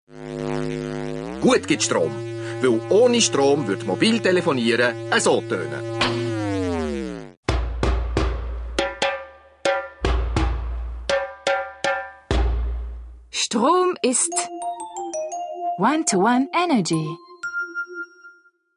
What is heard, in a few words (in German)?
Perkussiv energy